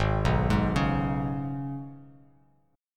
Ab Minor Double Flat 5th down Bass Standard; Abmbb5; arpeggiated

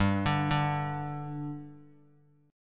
G 5th G Guitar, chord; G5 arpeggiated

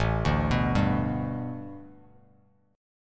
A 9th Suspended 4th Bass Standard, chord; A9sus4; arpeggiated; JGuitar

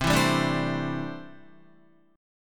C 6th Add 9th C down Guitar; strummed; JGuitar

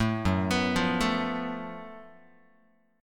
F sharp Minor Major 7th Double Flat 5th, Guitar; arpeggiated; 0,2,2,3,1,x; down; 4A,4E,5A,5D,5Gsharp,6Csharp

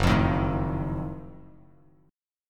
F sharp Minor Major 13th down, Bass Standard; strummed

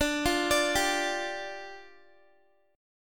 D Minor Double Flat 5th, down, Guitar; Dmbb5; arpeggiated

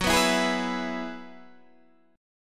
Gb 9th Suspended 4th Gb down Guitar Open D, chord

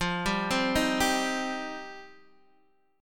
F 7th Suspended 2nd, Guitar Open D; x,8,5,6,6,5

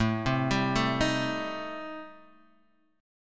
A-Minor 7th Flat 5th, Guitar Standard, chord; Am7b5; arpeggiated; capo=2

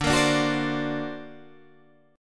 D Augmented 7th D down Guitar Open D

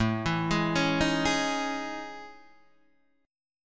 A Major Sharp 11th, Guitar Open D

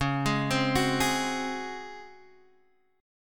Db Major 7th Suspended 4th Db down Guitar Drop D

